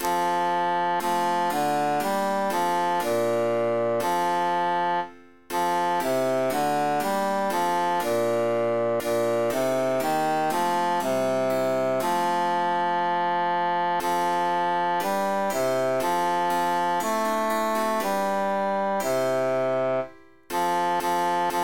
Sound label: bassus emphasized; o occhi